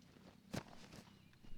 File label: step grass, footsteps